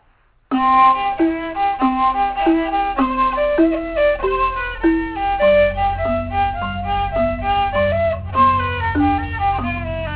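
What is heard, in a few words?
fiddle marimba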